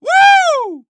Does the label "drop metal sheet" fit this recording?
No